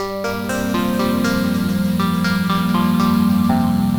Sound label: Tiny Lights, Bells & Tines; Sonic Foundry; The Electronic Music Manuscript Collection